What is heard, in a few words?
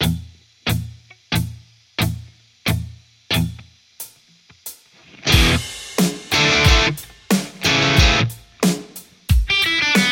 strat JCM800 hot; mercuriall